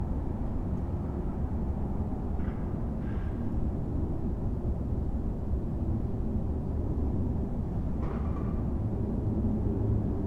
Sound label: Night Ambience